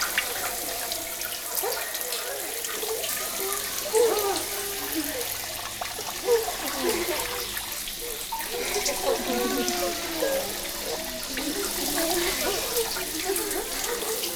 風呂場＆トイレ 同時 ループ

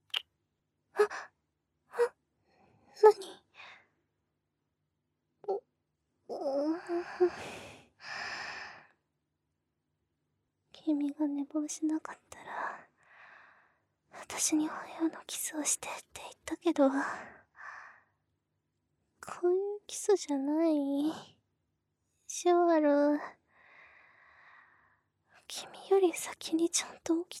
寝息トラック 起床用

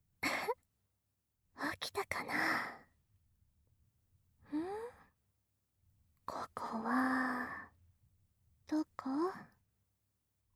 射精ボイスなし

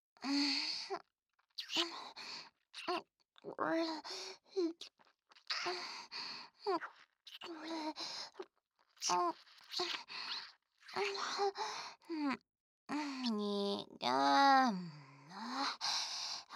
フェラ／耳元でささやき; ごっくんで感じる訳ねーだろ; よわよわ度150%

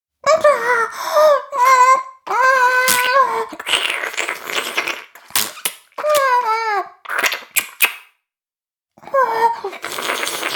妹 フェラ音声

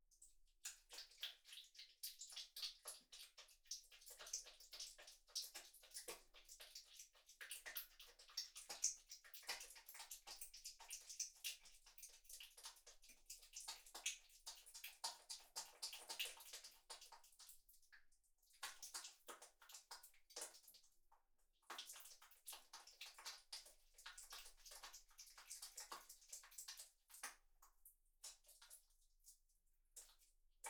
クチュ音 穴 普通の; 3DIO; アダルトな音

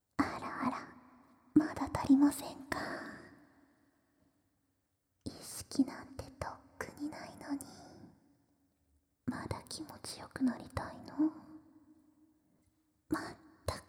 もっと耳舐め, ASMR

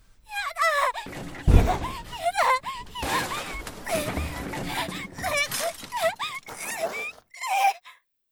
抵抗

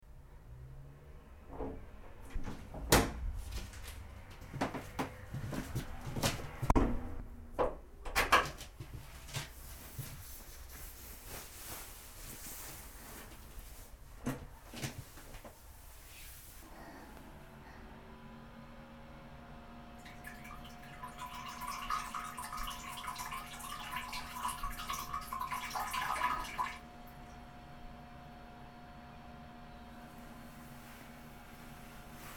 トイレ入るから出るまで, 少女のリアルトイレ; 生撮り！; 盗聴; 吐息あり

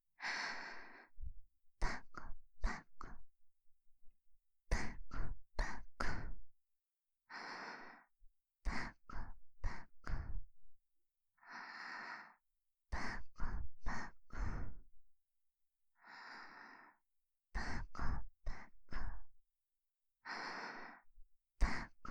パコパコ, ASMR, 左耳

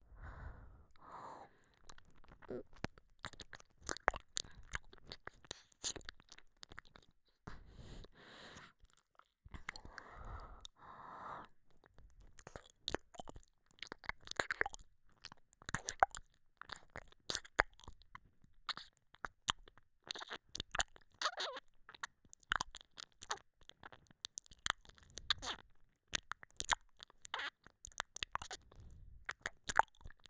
ちゅぷちゅぷちゅぷ…, ASMR

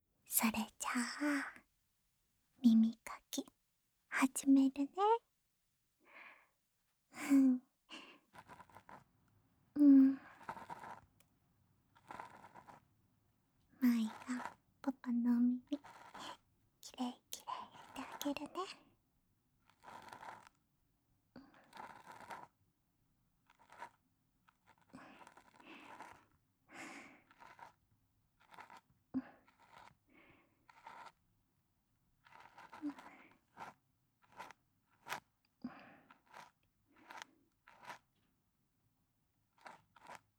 極細真鍮製耳かき棒; 深み

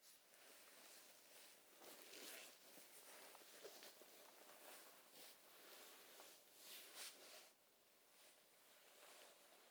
衣擦れ右から背後へ

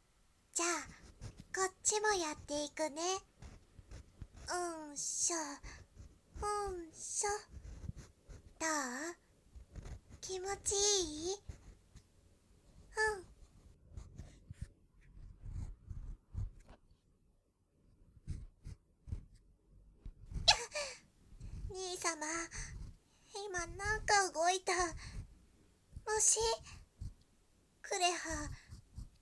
耳かき B; クレハの耳かきB

